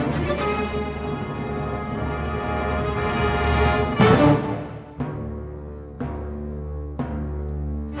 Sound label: Orchestra, Rock Pop